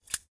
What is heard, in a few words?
Snip